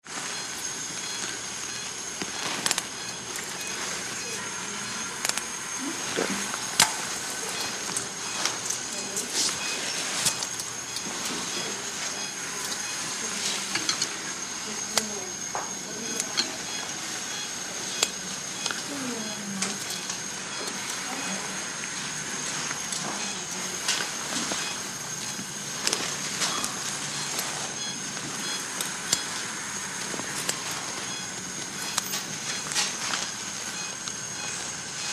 HOSPITAL EQUIPMENT HOSPITAL: Operating room, close up of surgery, tools & clamps, monitor in background.